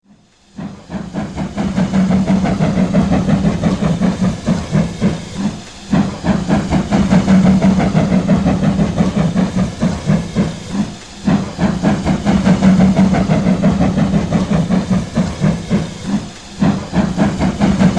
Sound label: steam slip ringtone